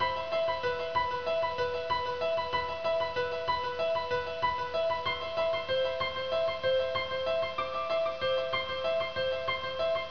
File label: tune; nzp; 8bit